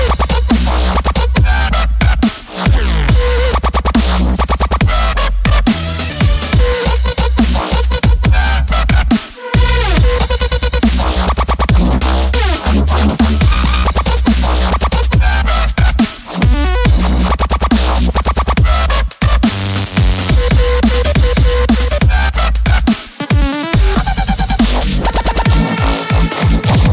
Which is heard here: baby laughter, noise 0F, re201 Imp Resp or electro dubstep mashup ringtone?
electro dubstep mashup ringtone